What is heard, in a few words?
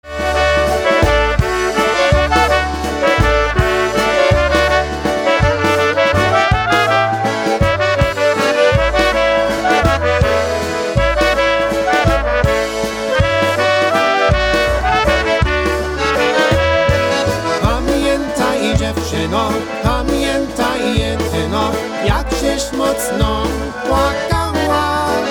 Waltz